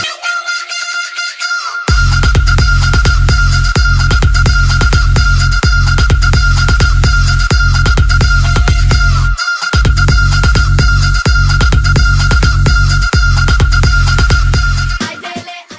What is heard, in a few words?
electro; roundsound